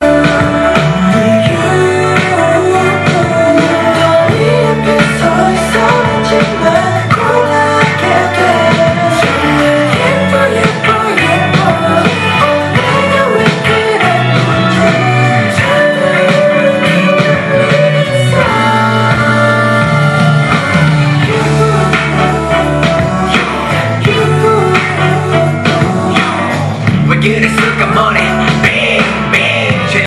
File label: korean ringtone